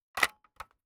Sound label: Audio Cassette Handling